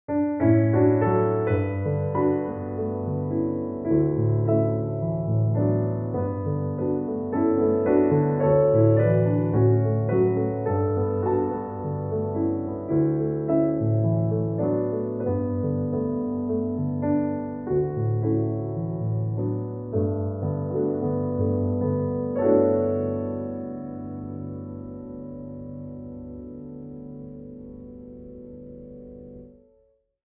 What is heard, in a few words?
Solo Piano